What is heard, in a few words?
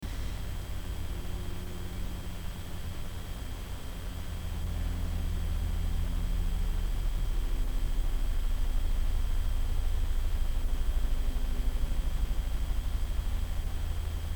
ambient